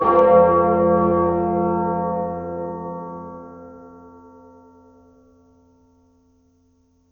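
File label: bell 346Hz; cccbr; glossop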